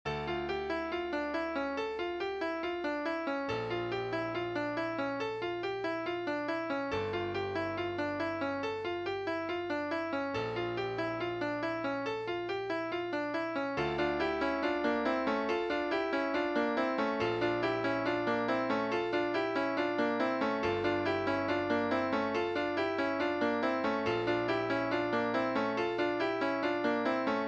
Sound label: Crappy Small Piano Pattern